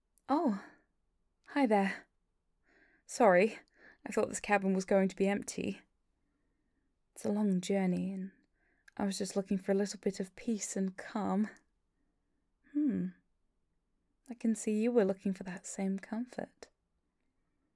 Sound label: Vocal Only